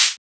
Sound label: Shaker; FLPRO